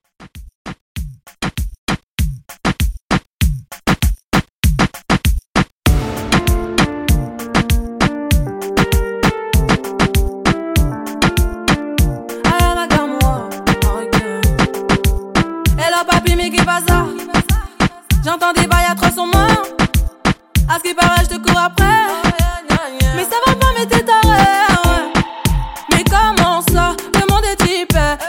RnB Dancehall 98Bpm

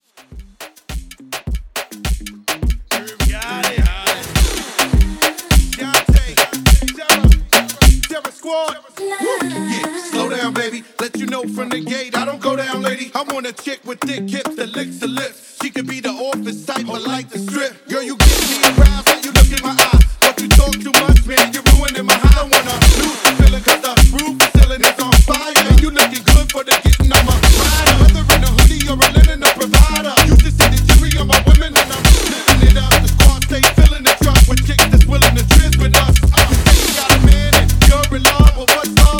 Moomba Drum Reboot 104 DIRTY

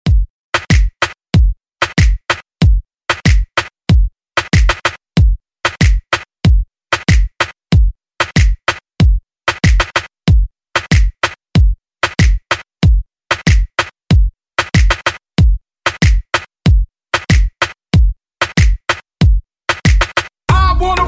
Party Hype; Deejay Hit; Redrum

